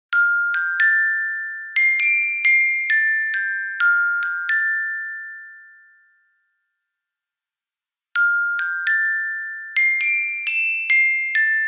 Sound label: Ring; Samsung GT-S7530 Omnia M